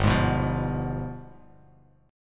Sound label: G 7th Flat 9th chord, Bass Standard; strummed; JGuitar